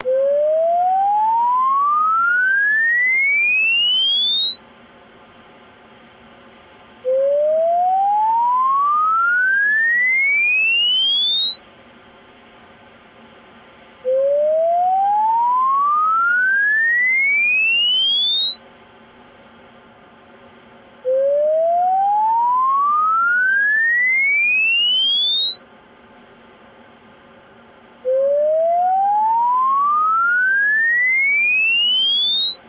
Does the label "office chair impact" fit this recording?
No